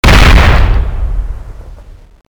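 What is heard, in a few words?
cannon explosion, weapons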